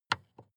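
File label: sports car interior volume button press; verkehr transport; autos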